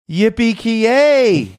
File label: yippee kee yay